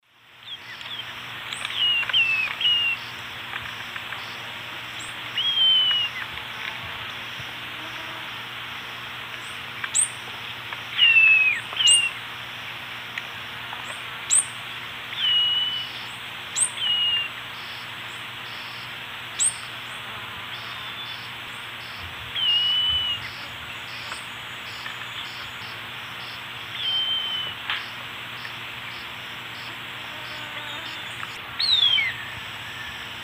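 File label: Notharchus tectus, calls playback; S. America; Eastern Lowlands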